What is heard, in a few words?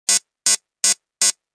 sci fi beep alarm